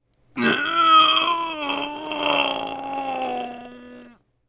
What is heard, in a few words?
Grunt; a dump in the dark